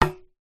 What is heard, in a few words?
sport tennis ball bounce on racket; zapsplat